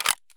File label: mp7 bolt; Escape from Tarkov